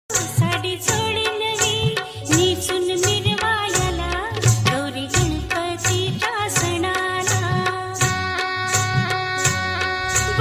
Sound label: festival marathi music; gauri ganpati decoration